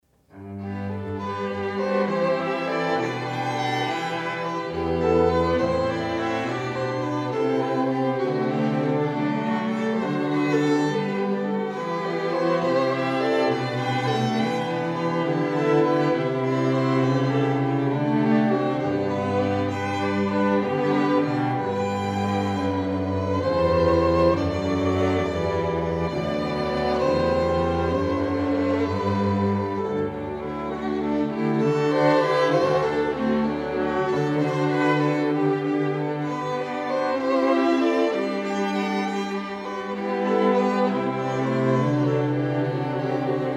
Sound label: strings; Jesu, Joy of Man's Desiring